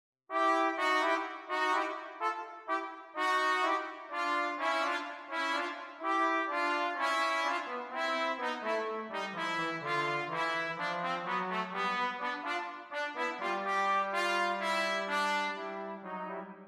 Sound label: Trumpet and Trombone Duet